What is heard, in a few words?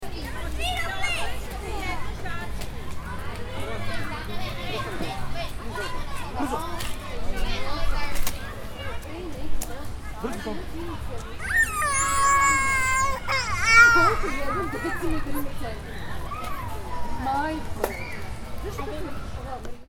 Kids in the park, childrens roar in the park